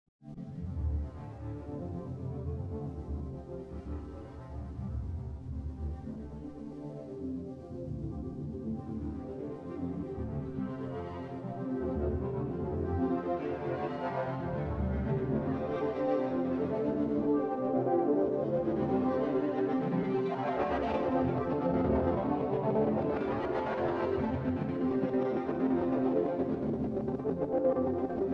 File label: Freak No Beats; quasi KRELL patches on the miniFreak